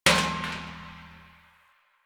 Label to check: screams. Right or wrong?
Wrong